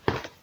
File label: foot steps; Walking; fmod-moloch